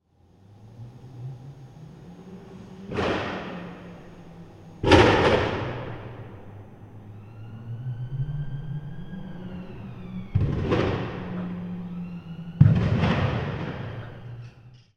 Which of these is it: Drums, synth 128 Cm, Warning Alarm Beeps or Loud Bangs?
Loud Bangs